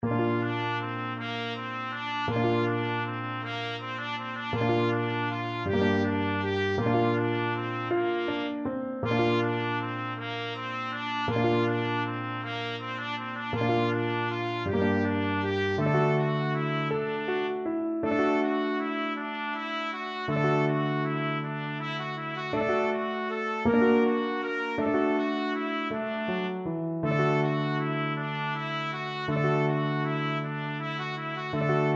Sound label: grieg morning, trumpet